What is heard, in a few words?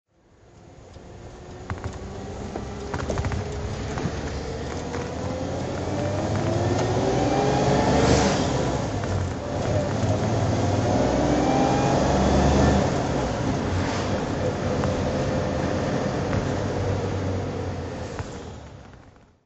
bentley r type drive, Bentley R-type saloon; 1953; Engine sound driving; V3D/ ClassicarImages; Marc Vorgers